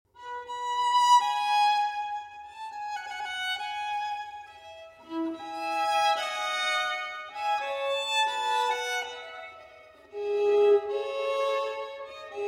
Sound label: Violin Partita, Loure; Violin Partita No. 3 in E Major; II. Loure